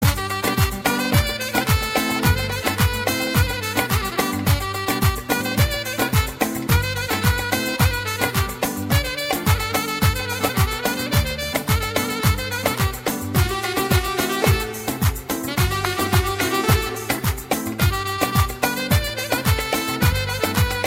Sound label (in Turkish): halay damat oyunu; oynak zil sesleri